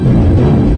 Vagnosaurus Roar; Trading Battle

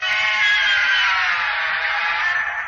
Roar; Anguirus; Showa

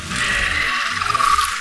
Squid Roar; Mire

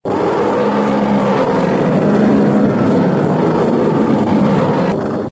roar; Agon

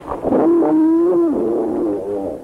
roar; Varan; 1958 American

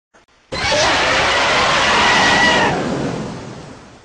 Mother SFX; Daigoro's Mother

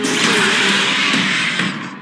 T-rex Roar; B-bot

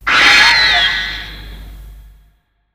Mothra Roar

